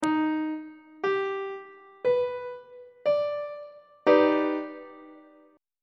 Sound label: d sharp augmented major 7th chord on treble clef